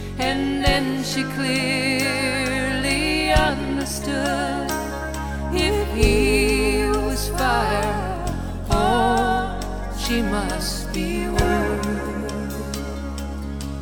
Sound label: music; wu xing; earth